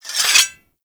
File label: Axe Equip; Warframe; Weapons; Foley